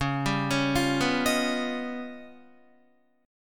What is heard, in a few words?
Db 9th Flat 5th Db down Guitar; 4E,4A,5D,5G,5C,6F; arpeggiated; chord shape; JGuitar